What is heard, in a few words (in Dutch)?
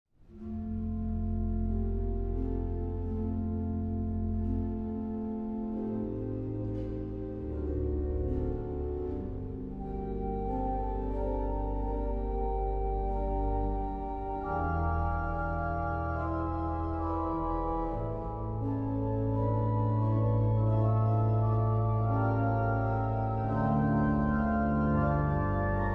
Intro Koraal en Toccatine (2 orgels en fluit); Psalm 119